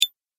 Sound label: Menu Select Single, ping; multimedia